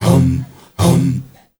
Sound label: MALE HUM; M.HUMMERS; Spectrasonics; Heart of Africa